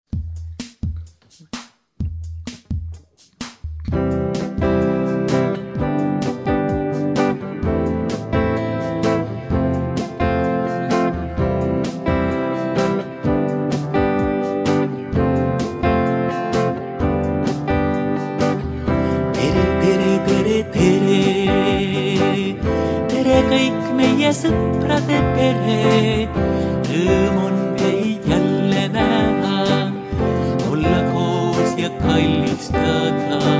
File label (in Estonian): Soprade laul